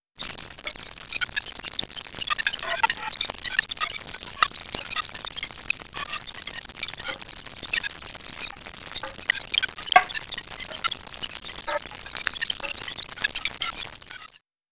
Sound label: this is rly high pitched; random and out of place